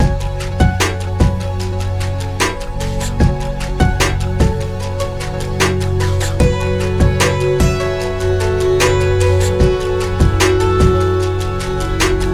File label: Bandura